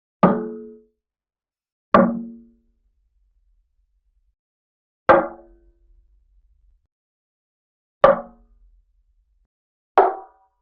5 udarov po bochkam

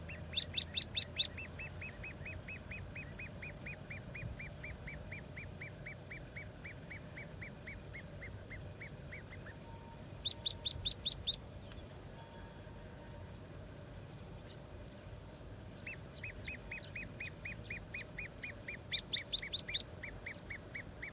peschanka

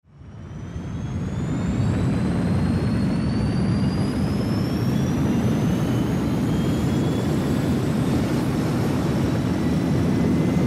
vzlet rakety

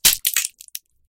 zvuk pereloma kostey

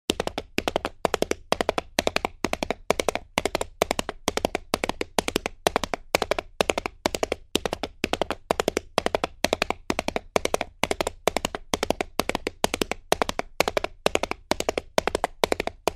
zvuk stuka kopyt bejashey loshadi

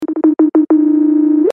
zvonok ojydaet; icq

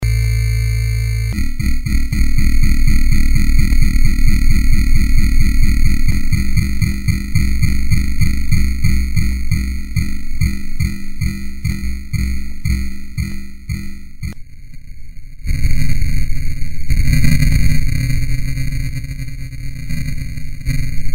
zavis ili dumaet; Зависание человека от сложившейся ситуации, эффект замешательства и ступора

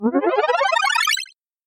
zvuk uskoreniya

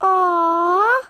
zvuk umileniya devochki

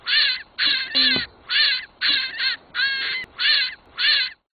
kunica

